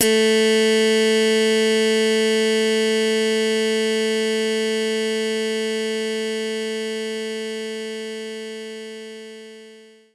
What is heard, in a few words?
Slap Bass A4